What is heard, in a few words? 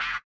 BUZZER; Spectrasonics; Metamorphosis